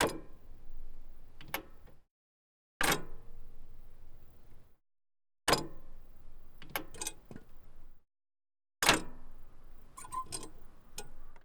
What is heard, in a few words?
HARLEY Foot Gear Shift Lever, Slow hit, x4, Harley Davidson; Game Audio Factory